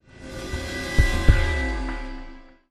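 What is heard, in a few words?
STINGER ENTERING THE CORE TRANSITION, soundtrack; transformers war for cybertron gamerip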